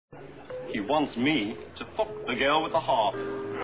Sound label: harp